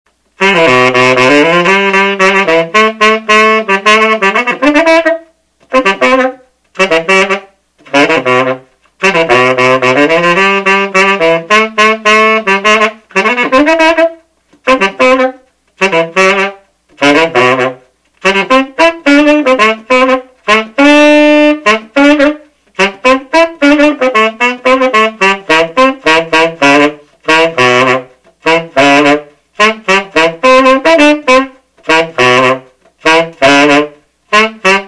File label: Buescher Killer Bee Big B Tenor Dixie